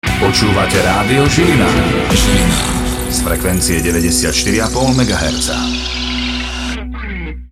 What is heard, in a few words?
jingle; zilina1